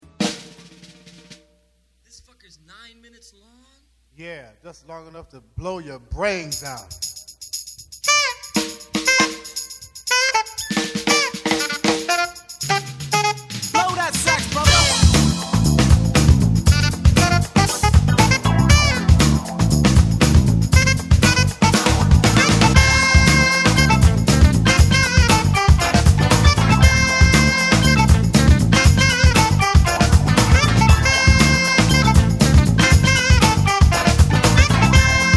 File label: Acid jazz